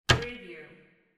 cabinet door close

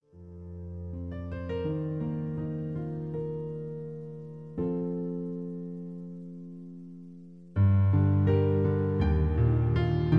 My Piano Version

